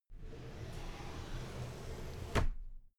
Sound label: drawer cabinet paper open soft